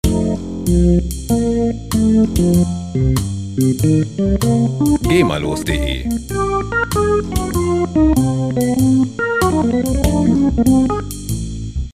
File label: hammond jazz